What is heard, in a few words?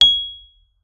MKFF G#7, RHODES; Xsample VOL-1